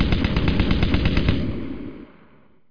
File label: auto can; machines